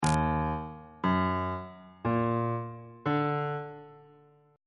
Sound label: e flat minor major 7th chord intervals